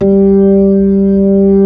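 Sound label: B-3, Hammond B-3 organ; Roland LCDP10 Keys of the 60s and 70s 2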